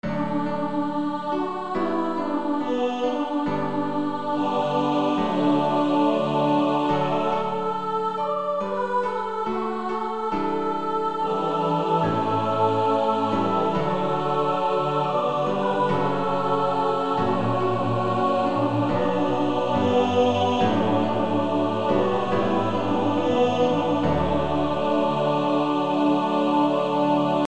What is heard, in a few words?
Male Choir TTBB; with Piano; 4-part; Somebodys Knocking at Your Door